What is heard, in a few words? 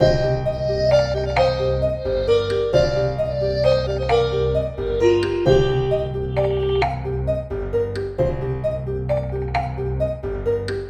clay loop; Blazer; platformer game